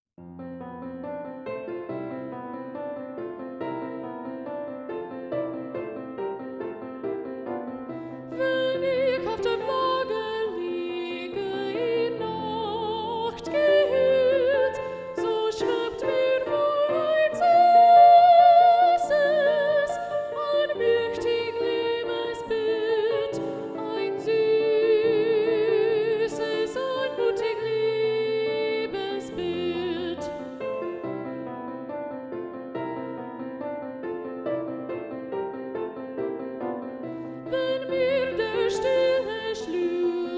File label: Abendlied SA - Alto Muted; Felix Mendelssohn